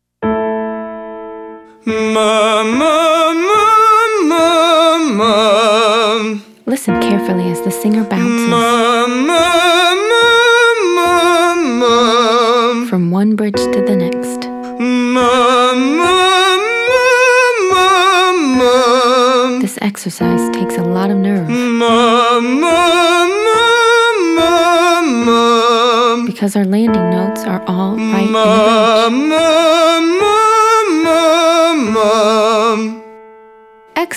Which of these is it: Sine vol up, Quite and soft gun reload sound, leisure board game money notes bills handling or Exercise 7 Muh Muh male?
Exercise 7 Muh Muh male